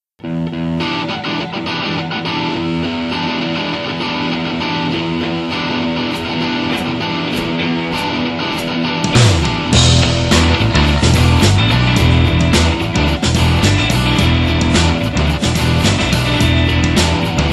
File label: Shuffle